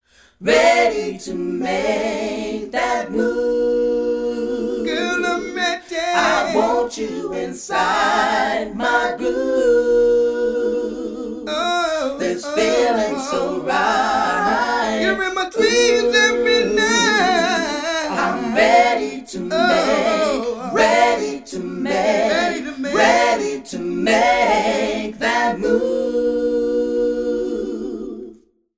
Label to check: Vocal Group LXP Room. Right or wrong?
Right